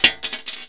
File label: brass metal; SpecOps2 MPlayer Demo